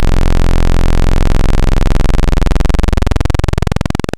PWM, Analog; Xfer Records Serum; Serum Presets

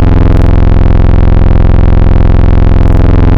Filthy, Analog; Xfer Records Serum; Serum Presets

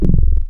Mini Bass, Analog; Xfer Records Serum; Serum Presets

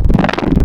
Reesey Mess; Xfer Records Serum; Serum Presets

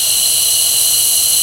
Air Can, Noises Organics; Xfer Records Serum; Serum Presets

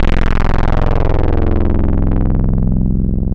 MATRIXY C64; Xfer Records Serum; Serum Presets

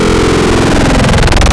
Ring, Digital; Xfer Records Serum; Serum Presets

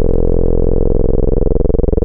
PWM, Analog; PWM Trench; Xfer Records Serum; Serum Presets

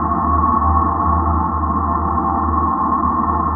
Dark Phuture, Serum Presets Noises; Xfer Records Serum

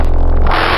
Trilobyte, Spectral; Xfer Records Serum; Serum Presets

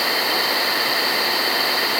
Inharm, Noises; Xfer Records Serum; Serum Presets; FP Inharms

